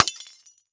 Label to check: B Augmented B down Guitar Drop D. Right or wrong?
Wrong